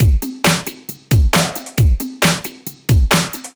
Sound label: 135 DRUMLOOP; Loopmasters; Booty Breaks Vol.2